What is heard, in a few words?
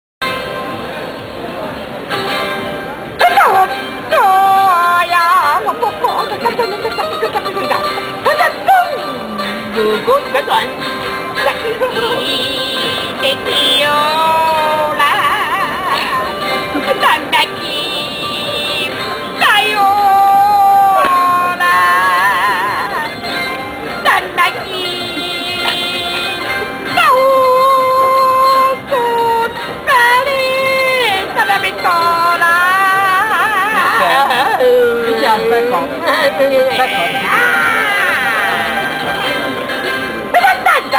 budapest chant